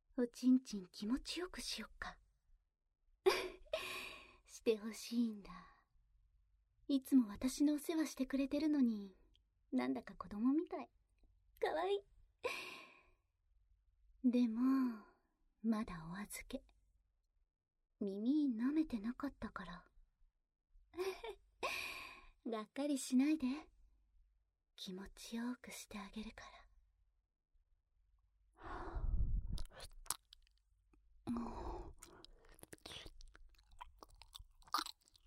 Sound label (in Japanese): 耳舐め; 脳に練り込む